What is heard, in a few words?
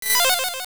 Nightmare Shadows Transform; Nightmare Shadows changing form